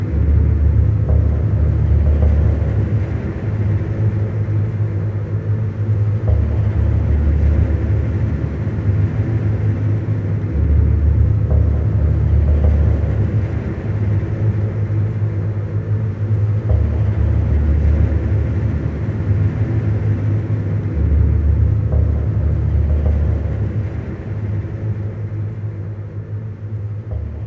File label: String Drone Loop; Zombie Army Trilogy; PS4